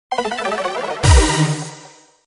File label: Game Fail; Airburst Extreme